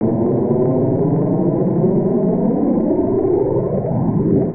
Warp; Bedlam

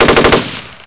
M249 auto; SpecOps2 MPlayer Demo

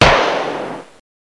GUN; The Epic Interactive Encyclopedia; Epic Marketing

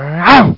bee bit

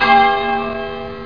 Church Bell; CManual-3.0

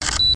Sound shutter sound; DIGICAME; Epson

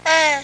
baby goo

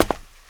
ladder step; wob2 demo

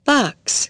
box; FRANCAIS

